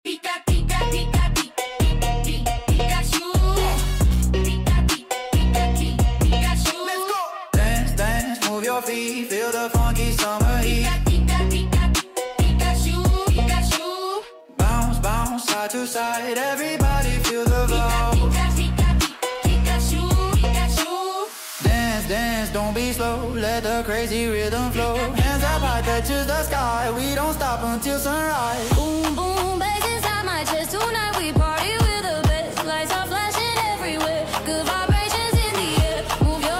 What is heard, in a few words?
Funky Summer Heat